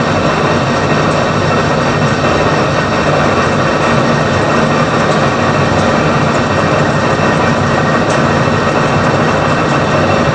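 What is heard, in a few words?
ambience industrial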